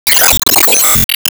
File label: Smash Shot Gold